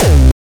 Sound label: Hard kick; Bluezone